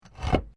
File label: PAVING STONE MOVE, dragging; Material components for constructing more complex sounds; Objects